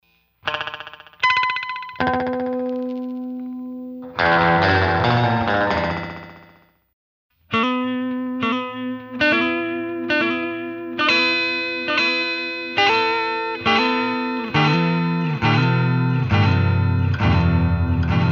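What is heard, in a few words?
electric guitar echo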